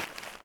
Garbage Grab

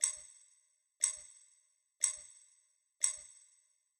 bgm game clock

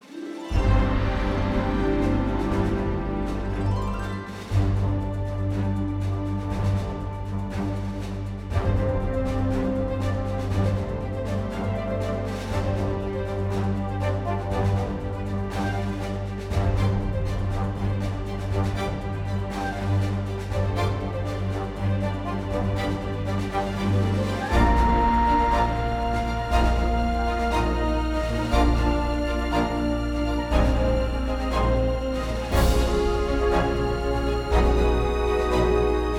Main Menu Loop Soft; Aces of the Luftwaffe Squadron

